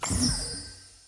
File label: color selected